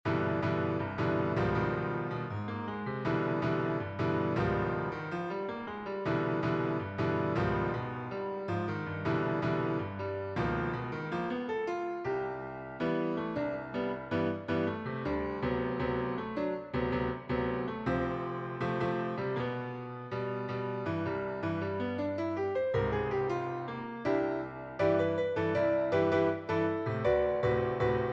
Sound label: in C piano